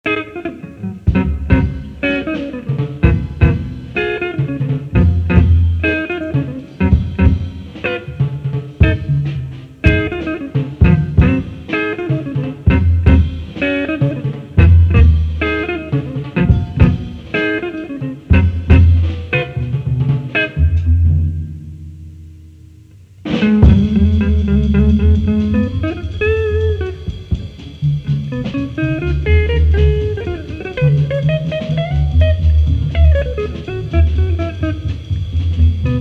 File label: Navy Jazz Trio